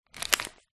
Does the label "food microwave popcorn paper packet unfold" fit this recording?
Yes